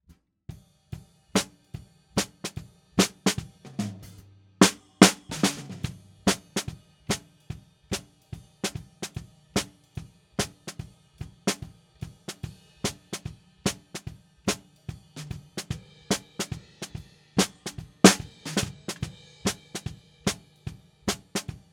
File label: SNR BTM